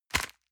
envelope grab hard

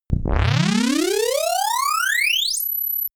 robot turn off turn on

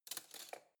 cutlery spoon drawer place soft

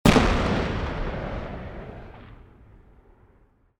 explosion

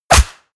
stylized fist punch

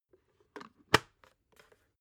water boiler close top long

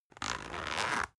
zipper soft

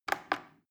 plastic button little click regular slow

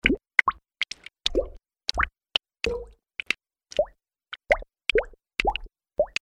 water drips

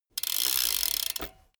bmx bike freewheel brake

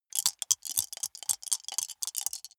small four rattle in hand closed

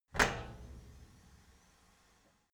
cleaning machine open handle quick

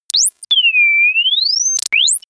small working droid

